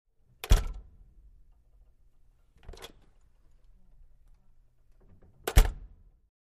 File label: Push Bar Door Close, push bar